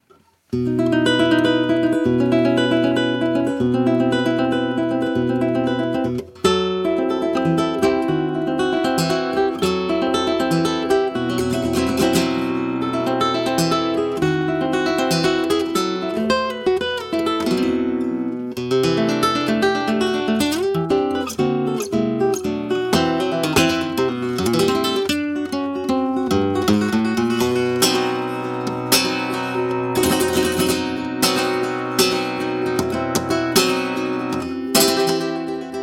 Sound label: Conde